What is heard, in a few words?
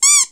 Squeak